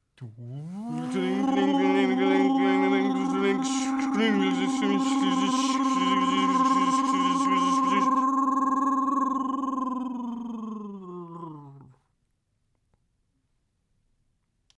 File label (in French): machine aspirateur de colle en bombe avec colle; ventilation